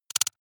noise clicks; maschinen; signale; soundpool

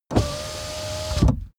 car window back close part

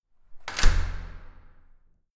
entrance door close hard

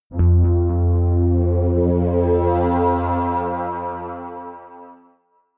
synth bounce

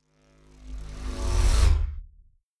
sci-fi vehicle drive by buzz; raumschiff

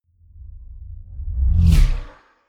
sci-fi vehicle drive by tonal fast; raumschiff

